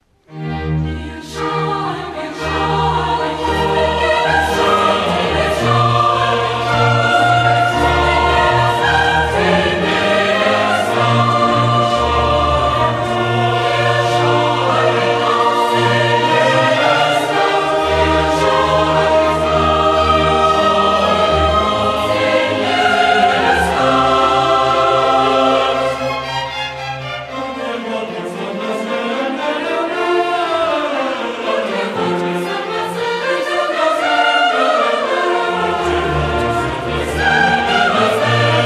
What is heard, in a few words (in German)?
Coro Chor; Haendel Messias; Ihr Schall ging aus